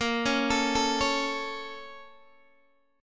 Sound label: A sharp Minor Major 7th, down, Guitar, DADGAD